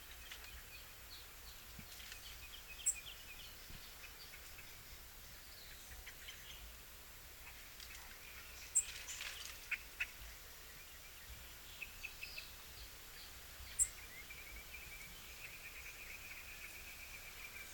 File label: Cuban grassquit